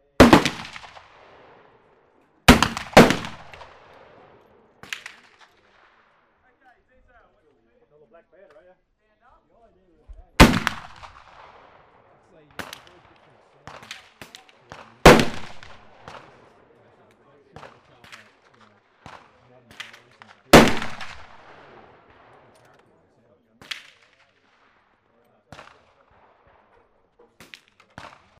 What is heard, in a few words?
ambience rifle club